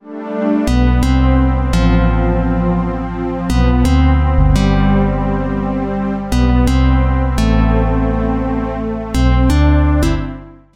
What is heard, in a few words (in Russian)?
sms opoveshhenie; tomnyj